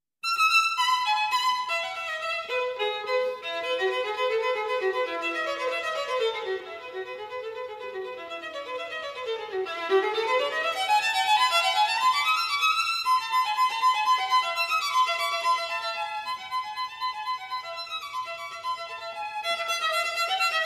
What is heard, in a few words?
violin